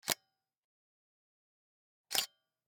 Camera, Pentax KS2, DSLR, electronic, mechanical, snapshot, exposure hold, release; Eiravaein Works; Vaeyan IV